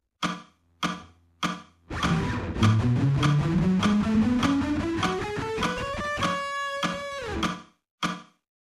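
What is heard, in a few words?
economy picking slow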